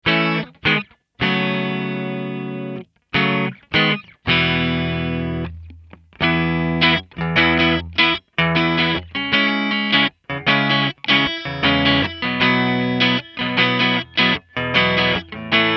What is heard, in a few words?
Sapphire